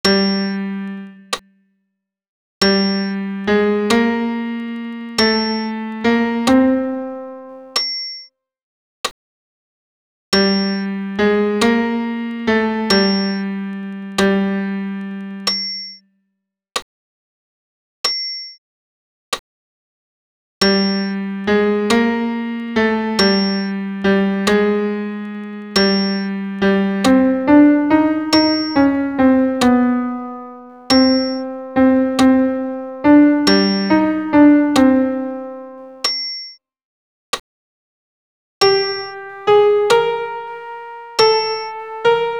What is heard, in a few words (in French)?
Crucifixus ten pian seul